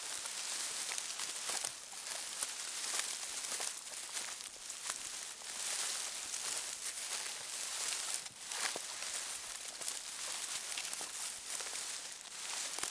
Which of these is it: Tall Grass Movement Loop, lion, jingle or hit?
Tall Grass Movement Loop